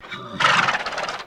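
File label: Snuffle, horse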